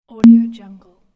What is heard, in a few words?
bottle bloop; electronplanet